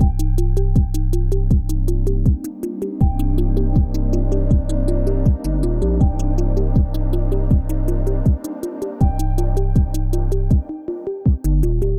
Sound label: Arp Buildup; BB 2HTC Samples Vol 4